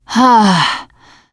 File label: pain relief sigh, teengirl; player; survivor; voice